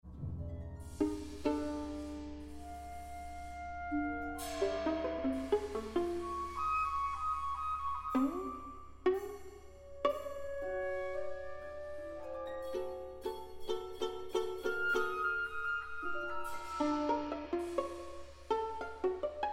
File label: VIOLIN CONCERTO